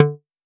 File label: hit